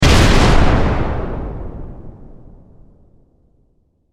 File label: Explosion Heavy; Explosions; SFX Bible